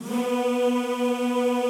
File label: B2 L, Classical Choir; Best Service; Extended Classical Choir